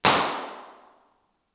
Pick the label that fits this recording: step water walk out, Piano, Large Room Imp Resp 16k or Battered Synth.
Large Room Imp Resp 16k